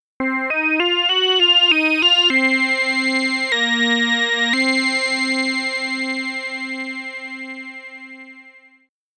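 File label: Slea, synth, funk; сэмпл синтезатора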